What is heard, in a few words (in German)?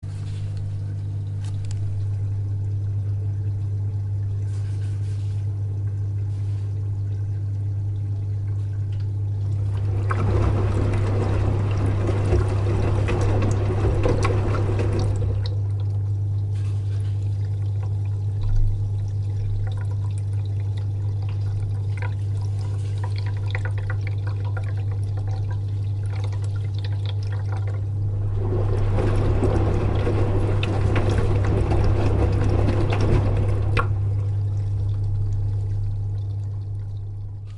waschmaschine